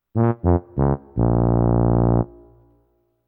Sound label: tuba short